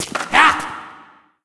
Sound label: attack crossbow; Fate The Cursed King; MONSTERS; PALACE GUARD